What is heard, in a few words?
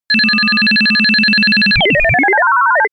telegraphic synth